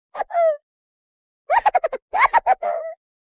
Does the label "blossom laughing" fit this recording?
Yes